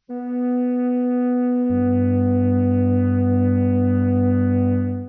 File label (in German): flöte